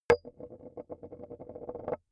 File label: metal impact wood light rolling